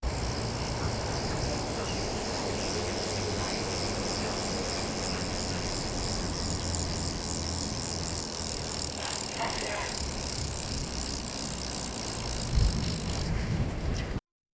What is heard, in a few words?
bike ride in sand; outdoor activities